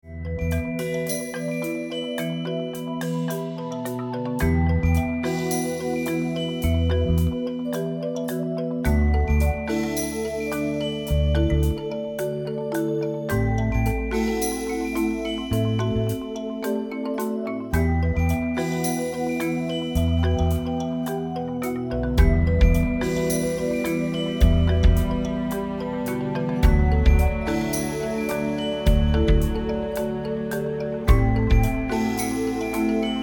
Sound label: Accompaniment; Mufasa